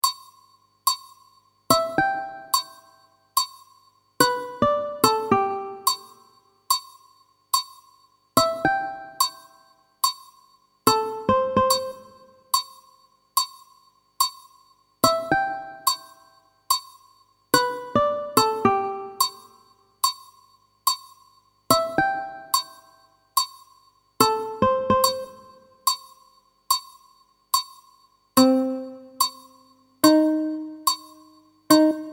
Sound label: uke Melo